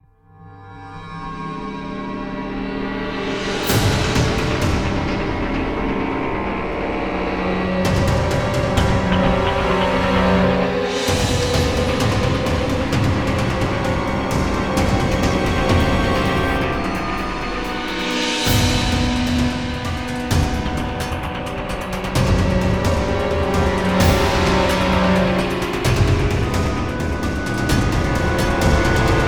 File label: Music Danger Alert Perc & Clusters; starcraft 2 wings of liberty; MissionMusic